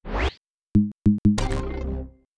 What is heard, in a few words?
wrong answer